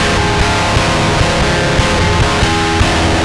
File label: Synth Distorted; Electrocution